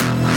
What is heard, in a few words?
Synth Sound; Vengeance Samples; VEE Synth Shots